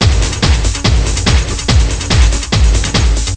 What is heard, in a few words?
HARDLOP, DRUMLOOP; Groovy Bytes: Behind the Moon